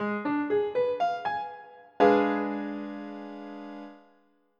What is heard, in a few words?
F dim